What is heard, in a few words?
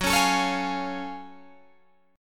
F sharp Minor Major 9th F sharp Guitar Drop D